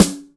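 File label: SixTeen Beat Snare; Roland SPD-S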